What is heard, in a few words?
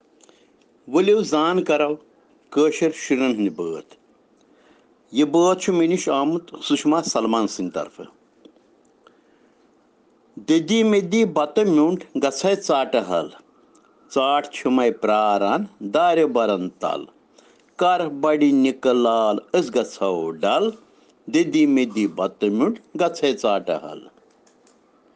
Children Rhyms in Kashmiri